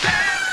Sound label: Scratch (Dry)